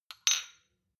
shot glass clink double; soundpool

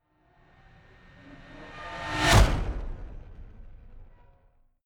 fly by hover car hum high

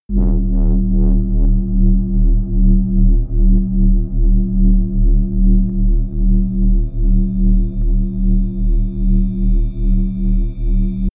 drone bass wobble static squeak

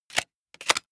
sci-fi cock plastic; comedy-cartoon; pistolen-gewehre

